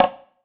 perc organic boong

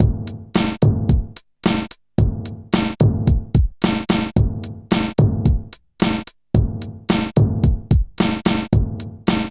HIPHOP; INIS; Funky Xtreams

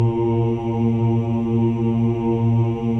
CHOIR OOS, A#2; Spectrasonics; Symphony of Voices

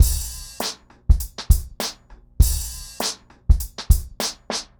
Remixed Drums 100BPM; The Roots Drumloops

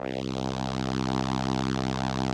SOLINA, String Machines

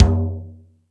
Toms 1, drum machine; E-MU Pro-Cussion-1

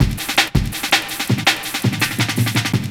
Duby Jungle Break

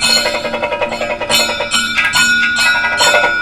KATAK, Indian Traditions

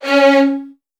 C#1, VN 16TH, Virtuoso Strings; ILIO; Kirk Hunter

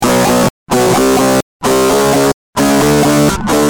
G Jam, Guitar; Industrial

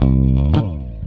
Rock, Bass; Bass X

